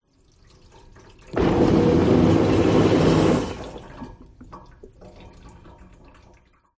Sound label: household under sink pump operate water drain; zapsplat